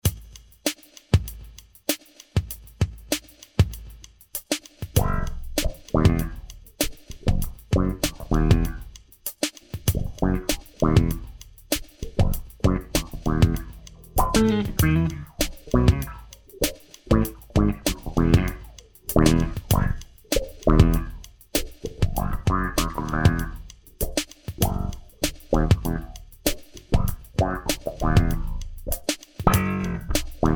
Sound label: bass mix